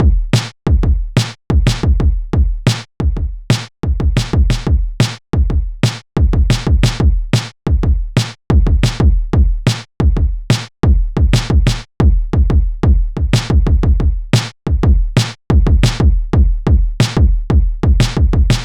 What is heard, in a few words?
Boom Chuck